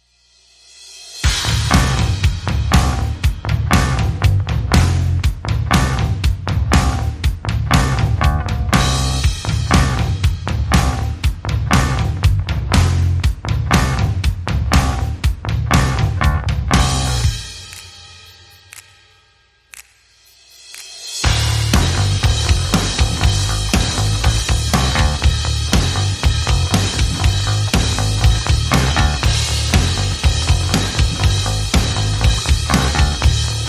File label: Drums And Bass Only